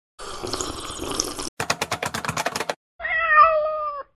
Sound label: startup